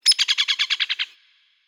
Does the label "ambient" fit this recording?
Yes